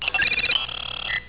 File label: beep; xfce